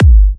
G, Kicks; markus hakala

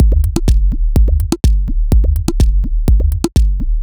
C 125bpm, House Drumloops

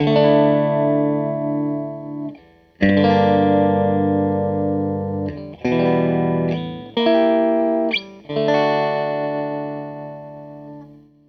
Strat Guitar Loop; Sonic Foundry (Sony Creative Software); Six-String Orchestra

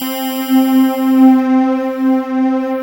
vectorial C4, ethereal atmospheres; Soundscan - Ethereal Atmospheres

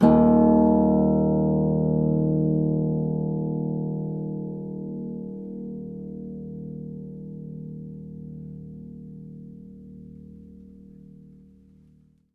HS D#1, Harp; Miroslav Vitous - Percussion & Harp

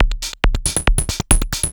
percussion loops, 138, Techno

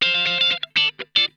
OCTALICK, Funk Guitar; Zero G - Funk Guitar

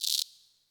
Percs, Elektron MachineDrum SPS1 MKII

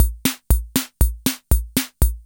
FOXTROT, KR55; INIS - Funky Xtreams

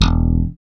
BASSES, Roland

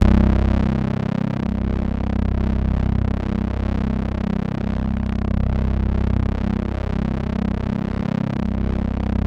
SUB WAVE, Special FX Twisted; USB Soundscan; AKAI

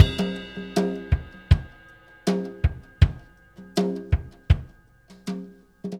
Tom Groove, Laidback

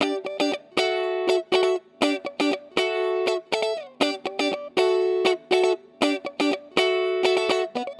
Guitar, 120bpm D, Nu Disco; Zero-G; Happy People

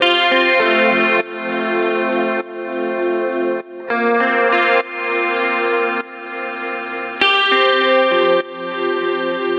D Gtr Bright Chords; Zero-G; Future Wave

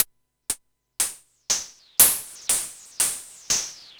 Gated Seq Noise, 120BPM

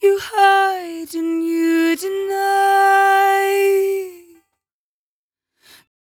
57bpm Am, Velvet Vocal; Zero-G

